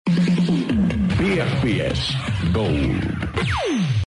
gold jingle; bfbs

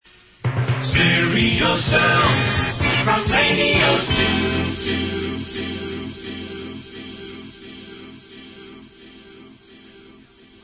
jingle

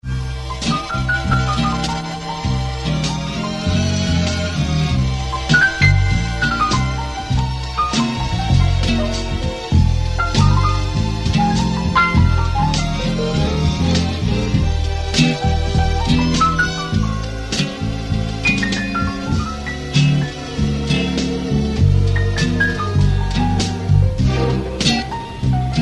jingle

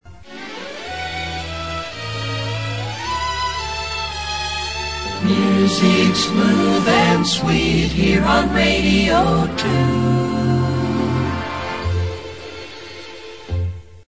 jingle

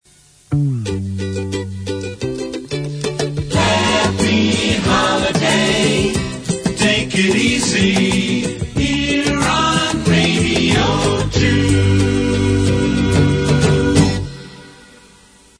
jingle

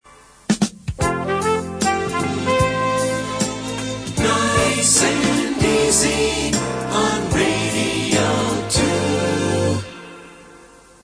jingle

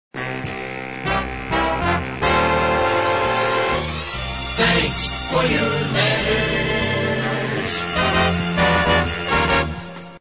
jingle

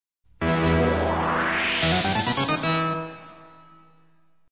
jingle; climber

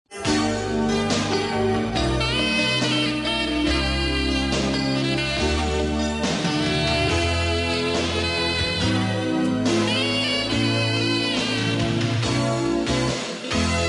slow jingle; bfbs

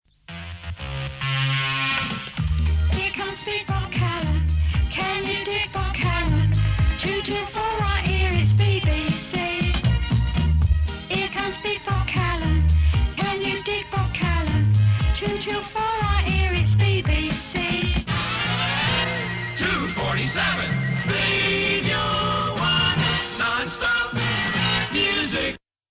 reggae

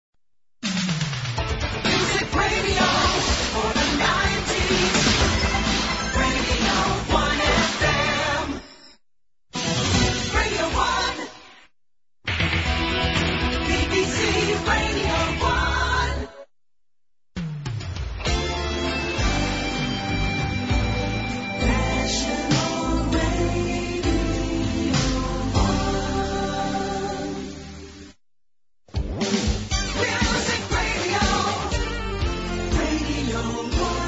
1990s jingles set

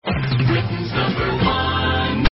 britains number one jingle

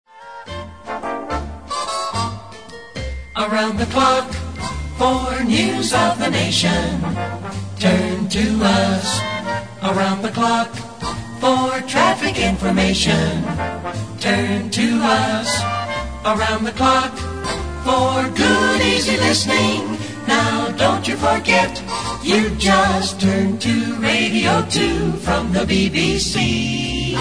jingle

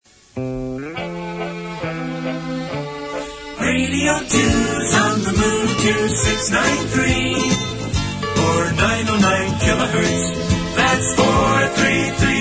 jingle

